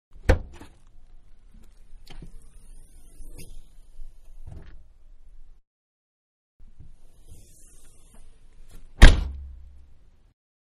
Mid Size Car Hatchback Open Close Exterior; Cars; Manhattan Production Music

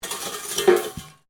METAL PIPE FALL; Materials

